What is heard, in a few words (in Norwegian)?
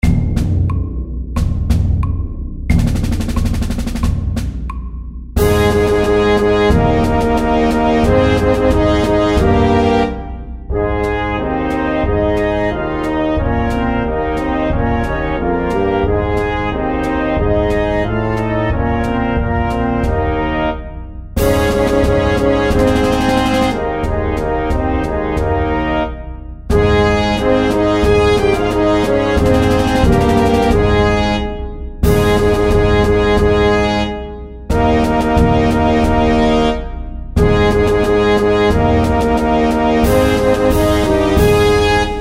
Fanfare and march SAKTE